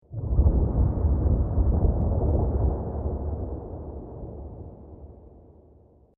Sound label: Distant Thunder Nature; Nature Footage Sound Clip; Thunder Sound Effects